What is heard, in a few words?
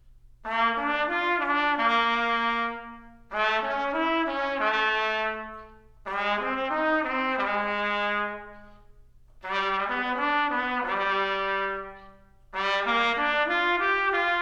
trumpet